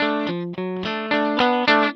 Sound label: rock D 110, Vintage Blues Guitar; USB Soundscan vol.22; AKAI; ROCK2